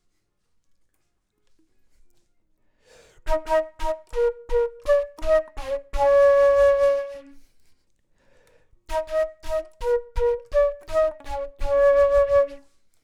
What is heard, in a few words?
flute; logic